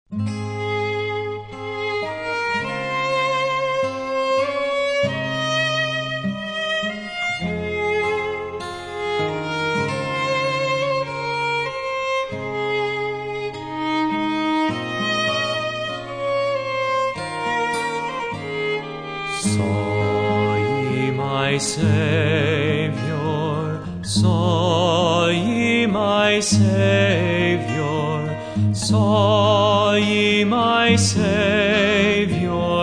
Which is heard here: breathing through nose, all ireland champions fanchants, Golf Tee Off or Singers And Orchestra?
Singers And Orchestra